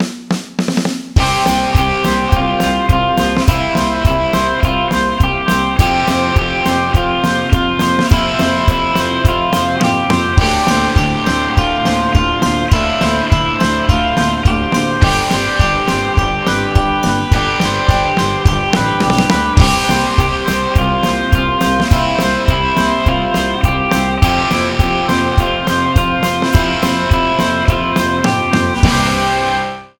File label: no keys, rock